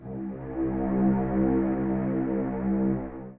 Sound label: pad; ensoniq.gs1